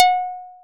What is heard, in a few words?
plucked, programmable speaker